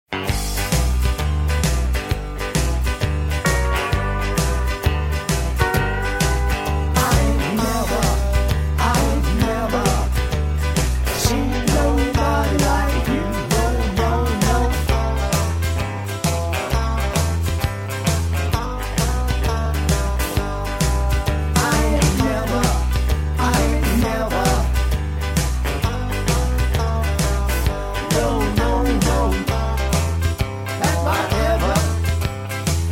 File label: harmony